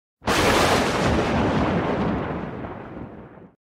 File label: thunder noise